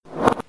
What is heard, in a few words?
Tap